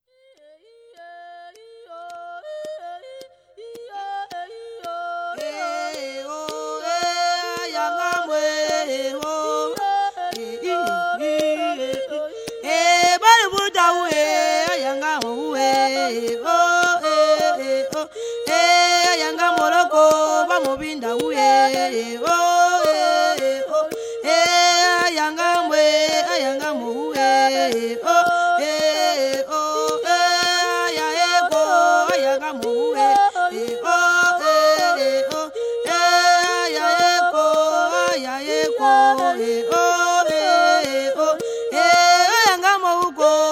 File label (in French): Chant polyphonique; Ba Passi ba Baaka